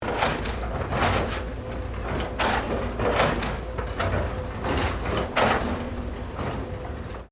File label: Demolition House wrecking, Industrial building demolition; Construction; Backgrounds